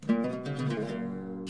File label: luth